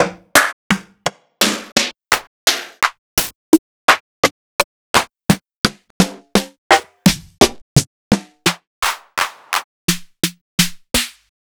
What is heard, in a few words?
sliced snares